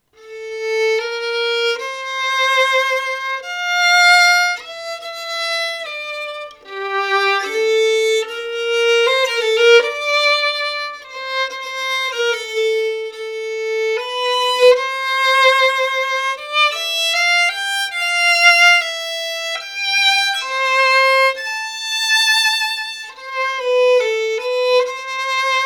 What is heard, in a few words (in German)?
Melodie, Geigenbau; Violins; Sacconi